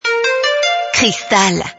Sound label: jingle; cristal